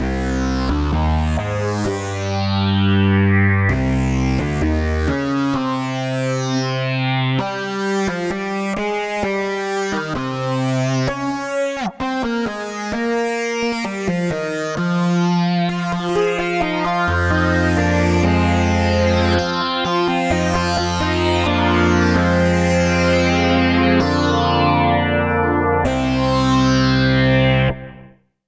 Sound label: Groove Synthesis 3rd Sync Lead